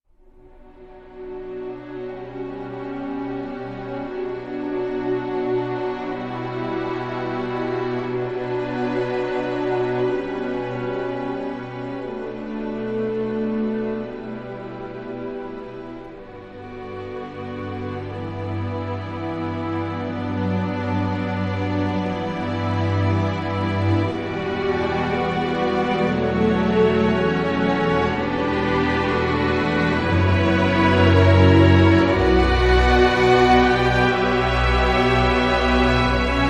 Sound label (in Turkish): Enstrümantal fon müzik Dramatik; Ufak Tefek Cinayetler